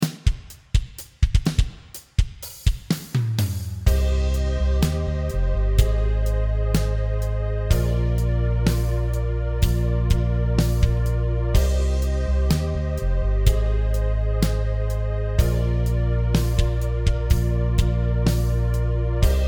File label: backing, guitar